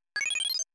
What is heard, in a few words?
eating; e.v.o. search for eden